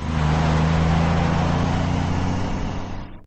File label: car passing; sfx